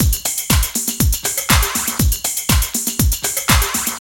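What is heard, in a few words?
drum loops, right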